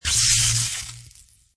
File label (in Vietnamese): Tieng soc dien ma thuat tan cong bang phep thuat